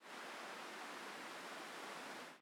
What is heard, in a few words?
Defeat Wind; Smite; Susano